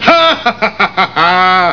LAUGH

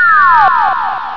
laser

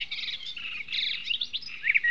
BIRDS

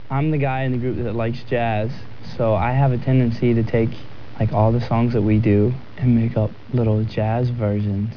jazz

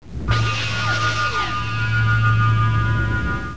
forcefield internal being turned on; enterprise

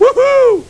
woohoo; simpsons

